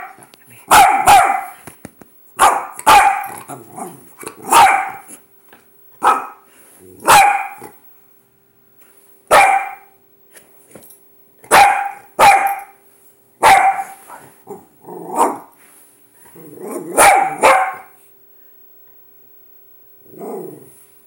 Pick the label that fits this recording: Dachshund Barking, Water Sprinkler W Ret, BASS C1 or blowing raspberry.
Dachshund Barking